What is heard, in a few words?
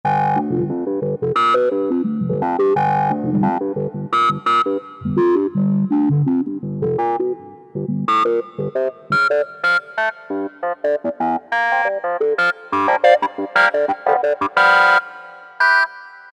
Digital; Generalmusic S2R